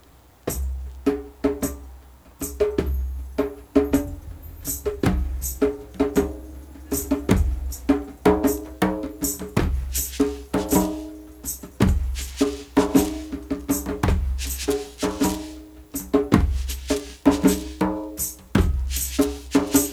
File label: Shaman Beat, Shaman Shuffle